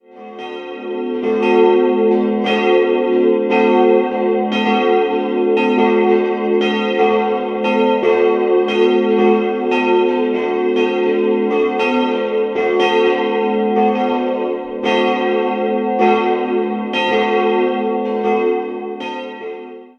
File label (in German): Hög, Glocken